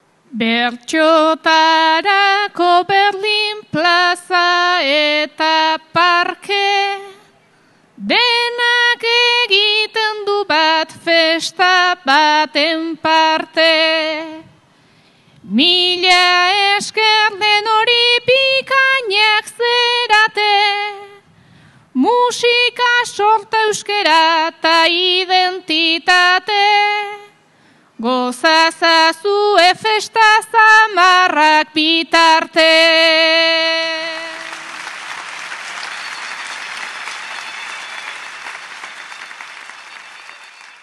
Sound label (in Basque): agurra, bertsoa; bilbo